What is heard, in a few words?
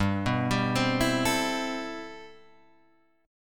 C Major 13th G